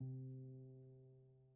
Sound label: GPiano sus C2, Grand Piano, Kawai; Chordophones; Sustains